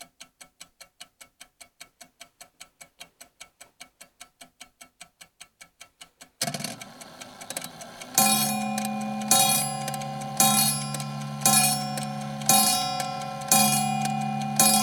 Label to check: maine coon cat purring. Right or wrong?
Wrong